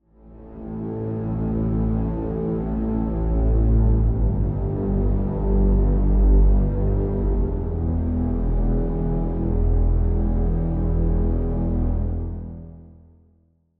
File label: Pad; Routed Sound; Sylenth1 Expansion - Flame Summoner Vol.1